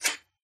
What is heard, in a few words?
card